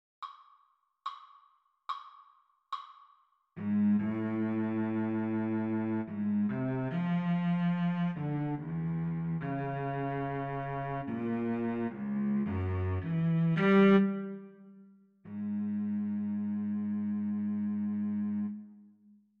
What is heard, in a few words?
melodic error detection; ear training